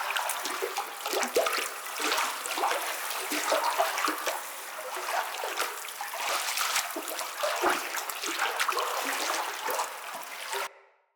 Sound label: Acid Loop; Ambience; Bromius Inside